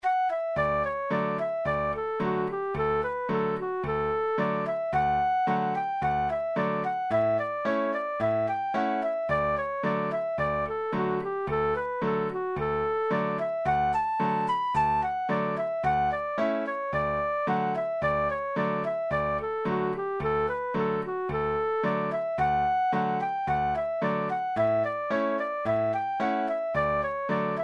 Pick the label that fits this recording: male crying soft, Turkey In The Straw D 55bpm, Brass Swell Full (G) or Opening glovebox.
Turkey In The Straw D 55bpm